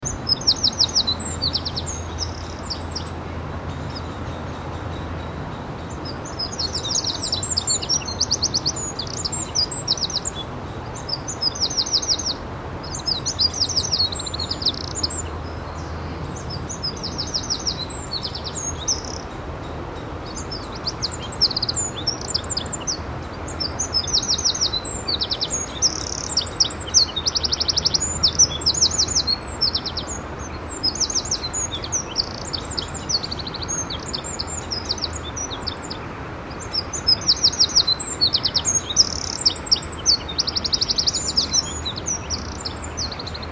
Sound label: Troglodytes troglodytes; SETE